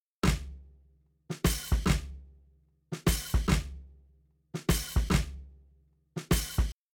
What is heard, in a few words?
Drums, shuffle drums; WDR2